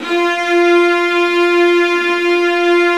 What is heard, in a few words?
Vas2 Bright; Roland L-CD702; Vlas Sect1-2